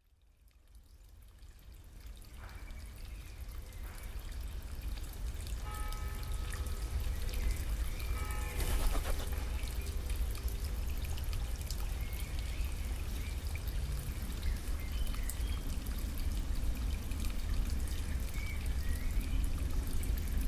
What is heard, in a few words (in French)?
VOIX DE L EAU; PaysagesIsère